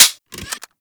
Fire Mech, rifles; Snipetron

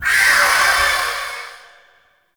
Blade And Whip Heavy Vocal; melee; weapons; Warframe; Dagath Blade And Whip

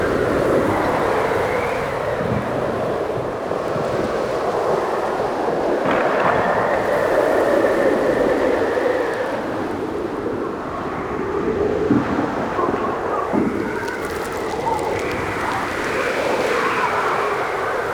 Settlement Exterior Ambience; Warframe; Grineer Settlement

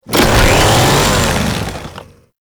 Saw Attack Rev Heavy; melee; weapons; Warframe; Grn Ghoul Saw Player; Kuva Ghoul Saw

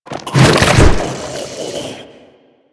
Infested Ancient Strike Flesh; Enemies; Warframe

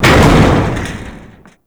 Large Elevator Door Open; Doors

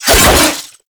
Drill Scythe Spin Whoosh; Warframe; Grn Drill Scythe

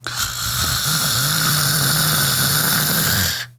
Snores, Daughter, Vocalizations; Warframe; Deimos

